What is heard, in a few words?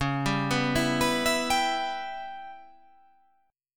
C sharp Minor 7th Flat 5th C sharp